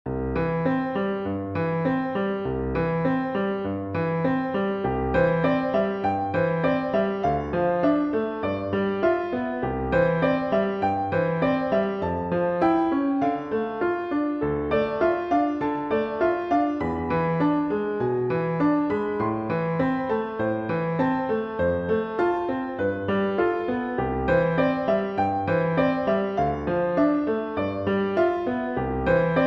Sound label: piano miniature